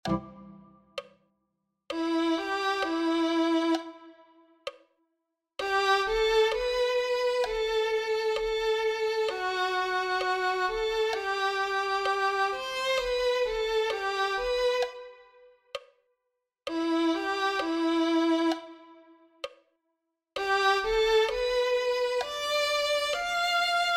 santo porque es glorioso violin

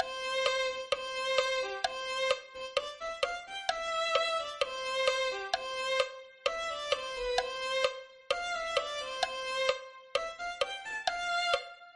violin

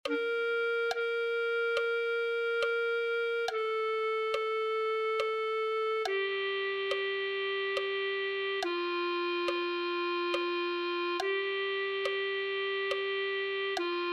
se tu nuestro dios clarinete

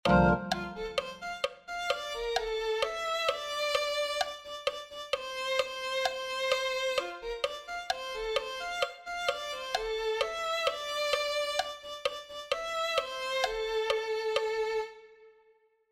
viene una grande gloria violin

